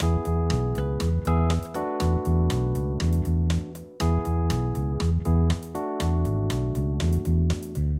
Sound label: Music Loop Happy; Yousician; Android